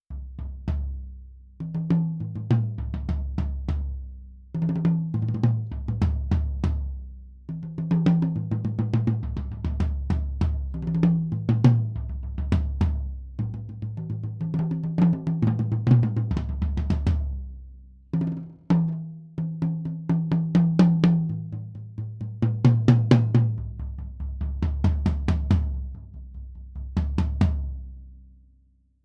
Toms; sE Electronics V Beat; FLACH

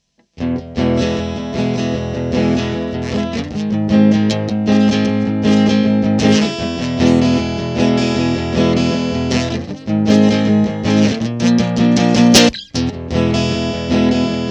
Amp2 Audix D2 mono

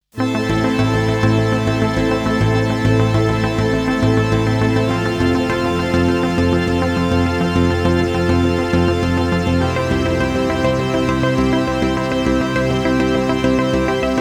Moog; Wave224 LA

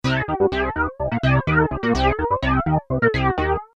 Minimax Saturn Bass Harmonic Flanger

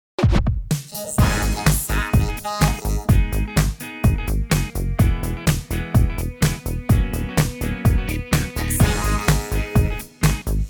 Fresh Dry

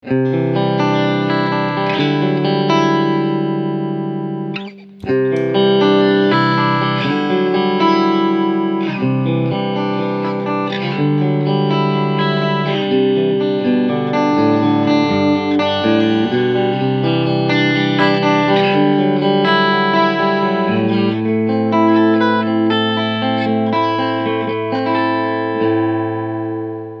Cleansound Dubs

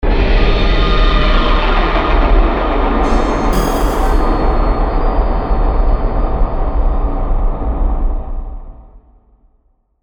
Scoring Accents; Gravity 2

